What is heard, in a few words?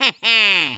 throw; tf; waluigi